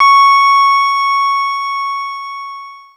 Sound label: WINE GLS, Synclavier Sampler; Synclavier Sampler Library; KEYS AND GUITARS